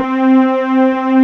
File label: SAW; Pro-Rec - Synthsonic